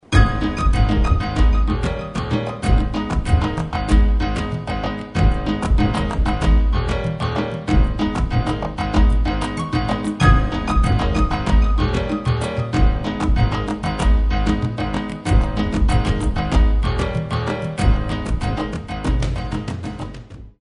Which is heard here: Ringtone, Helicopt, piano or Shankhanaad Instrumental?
Ringtone